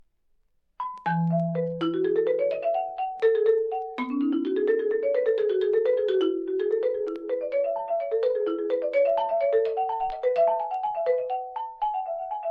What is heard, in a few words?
Gigue